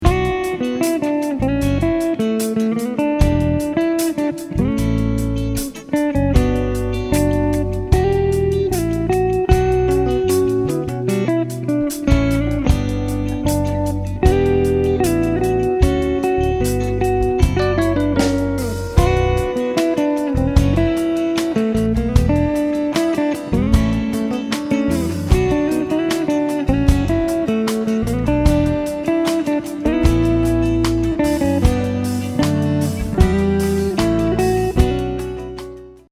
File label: Jazz